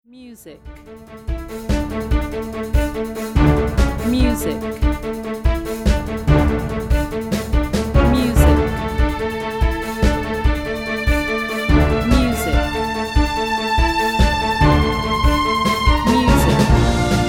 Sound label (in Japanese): YouTube配信時BGM